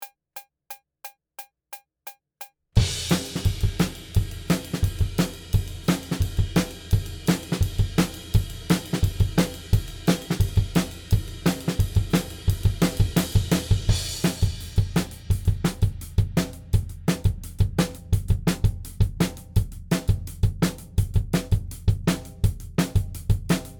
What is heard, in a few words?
Drums ONLY; Pre Pro